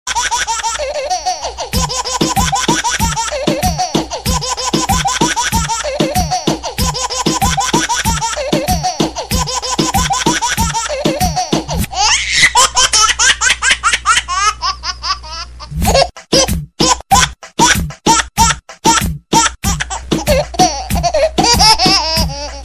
Baby Laugh

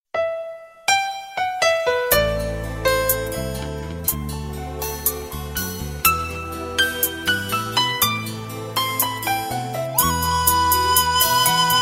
Tender Melody

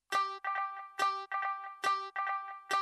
Sarangi

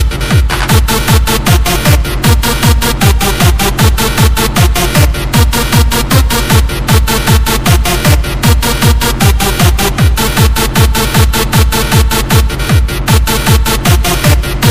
2000s techno

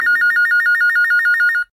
Office Phone

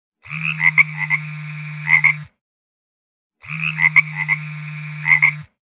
Bullfrog

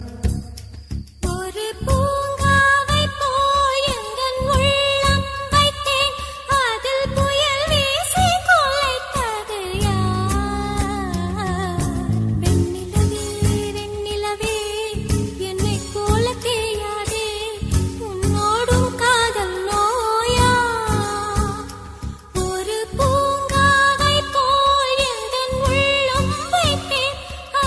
Female

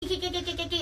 Kikiki

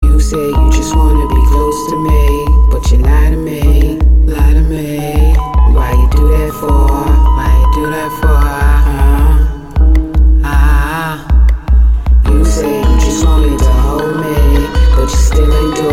Kisses

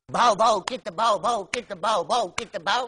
Dog Toan

